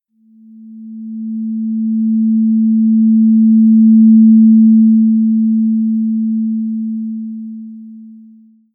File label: 221Hz fork